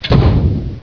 footfall

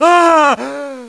death

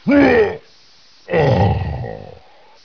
scale death

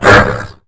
sleep pain; sleeper

